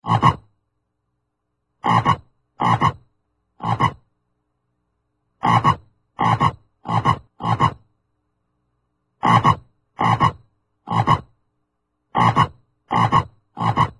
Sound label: bullfrog ribbit